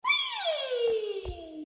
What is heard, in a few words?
weeee